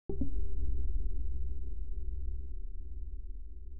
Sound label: move cursor; PremiumBeat SFX